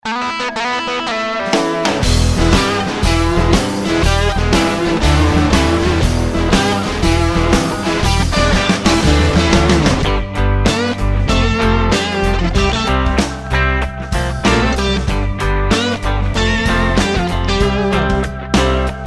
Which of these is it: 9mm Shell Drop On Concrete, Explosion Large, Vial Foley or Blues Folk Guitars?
Blues Folk Guitars